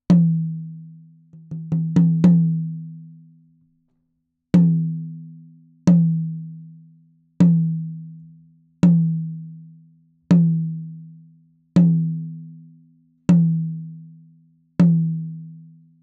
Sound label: tom I; md 421 kompakt; mikrofonu test